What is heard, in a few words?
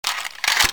clip in, weapons; csco; csgo